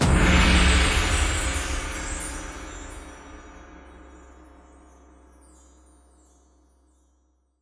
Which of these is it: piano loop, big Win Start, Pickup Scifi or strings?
big Win Start